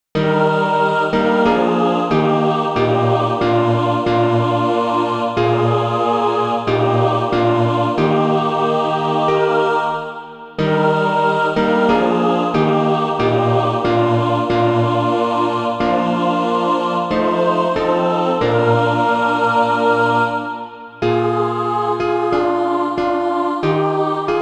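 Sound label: mixed, SATB Practise Music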